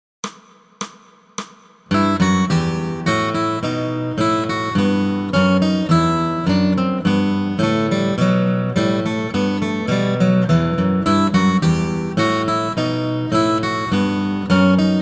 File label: Bourree Guitar 1 and 2, Bluegrass Guitar; Bourree in E Minor